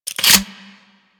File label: Grenade Launcher Reload; Grineer